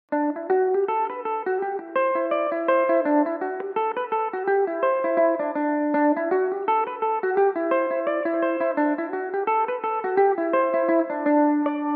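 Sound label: D mixolydian, irish mandolin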